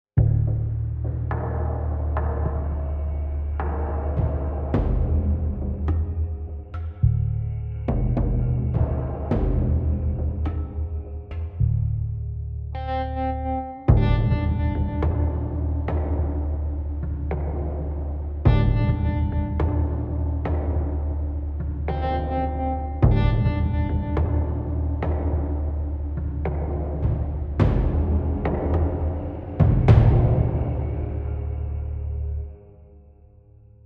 Tension; The Last of Us; AB Tension 5 30 Seconds Left

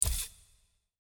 UI Menu Easein; A Year of Rain

